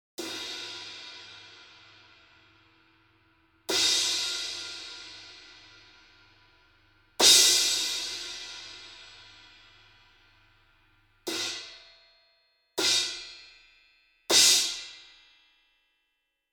Crash Cymbal 19 - Hickory Sticks

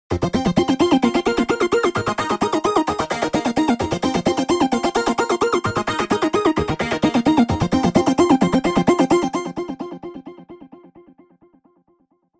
Arp Synth - Vocal Space; Spatifier

